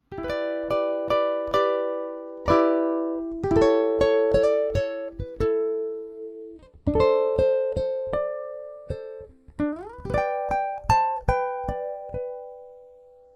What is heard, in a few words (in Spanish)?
ukelele